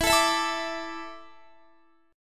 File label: E 7th Suspended 4th, Guitar; E7sus4; strummed